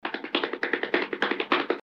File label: scramble